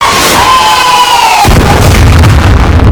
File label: firework explosion